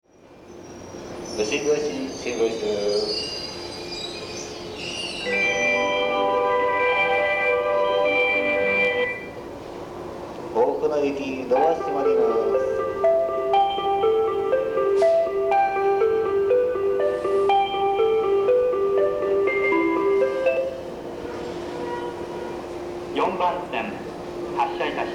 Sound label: shimbashi melody; 3・4番線（京浜南） （山手外）発車メロディ; jreast; yamanote